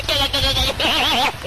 goofy ahh laugh

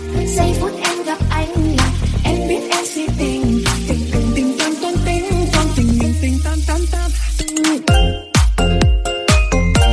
tingtang

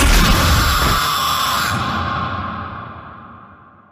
jumpscare sound; fnaf 6

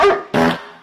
bark fart sound

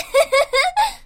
cute girl laughing sound effect

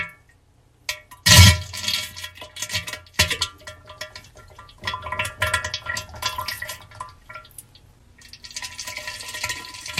diarrhea toilet bowl sounds

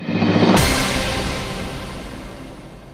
sting; weakest link

